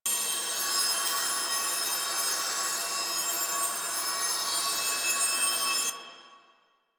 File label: Citrine Empowered Geode Loop; Warframe